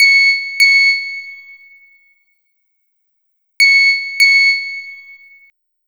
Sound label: beep beep, ringtone; openmoko